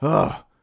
panting; foreman spike; SM64 CO-OP

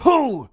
yah wah hoo; foreman spike; SM64 CO-OP

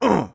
"uh"; foreman spike; SM64 CO-OP

